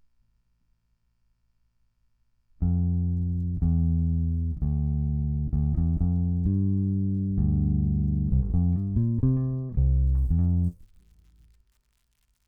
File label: bass